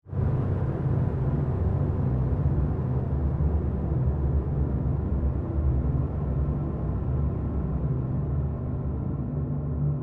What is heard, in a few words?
Created Pulse Fade Long, Rise And Dive Sound Effects; sound building blocks created through manipulation of raw material